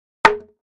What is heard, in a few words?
2x4 Impact, hits